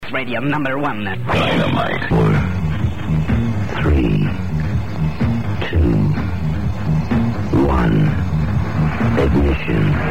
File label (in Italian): stacco